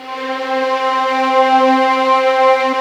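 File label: violins, arco, slow; String Master Series; Vlns 2-5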